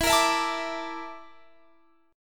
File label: A sharp Major 7th Flat 5th E